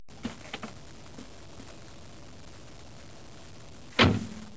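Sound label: TRAFFIC, car sounds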